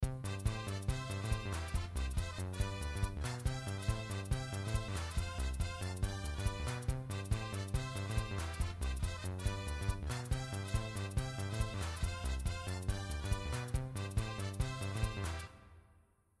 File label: unexpected music; prank